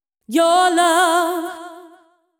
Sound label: deep house female, 125, A, vocals